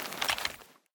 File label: p90 hide, weapons